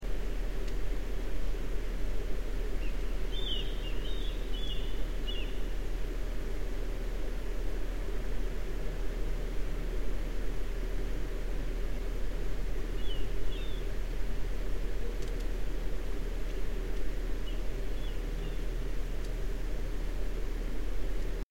mystery call

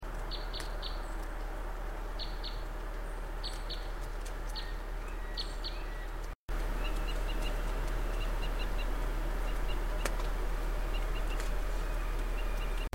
crossbills

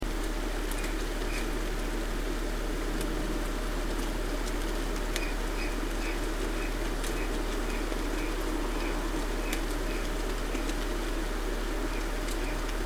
mystery chirps

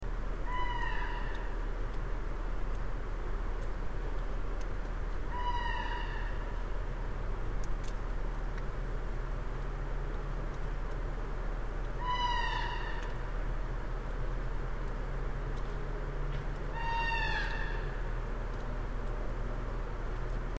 raven

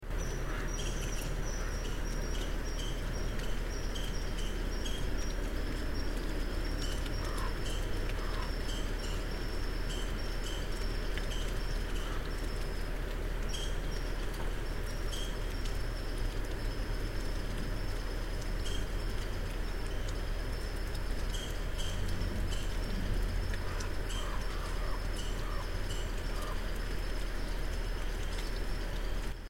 red squirrel

